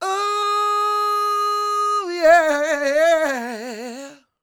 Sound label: SOUL, Vocal Planet, Blues & Soul; CW SOUL